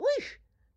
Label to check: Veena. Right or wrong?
Wrong